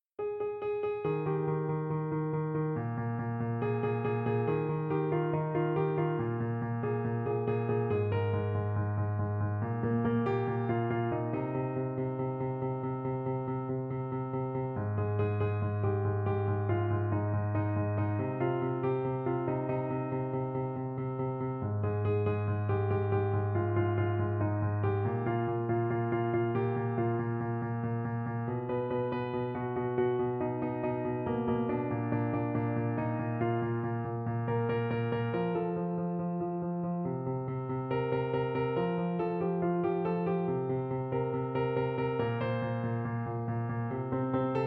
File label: Easy Piano; The Lego Movie